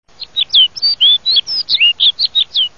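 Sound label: punochka golos, snow bunting voice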